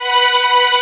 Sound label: VIO LOOP; 3D Sound; 3D Keys VOL-1; 3D KEYS CHORD